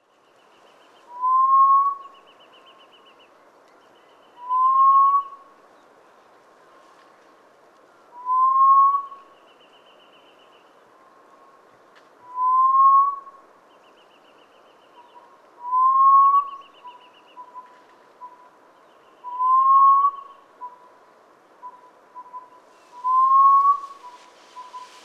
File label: Hill Partridge Arborophila torqueola